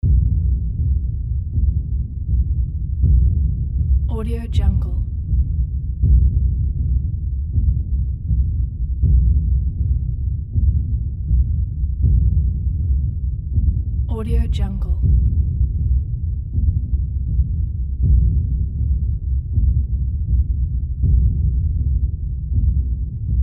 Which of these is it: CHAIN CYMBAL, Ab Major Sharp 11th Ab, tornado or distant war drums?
distant war drums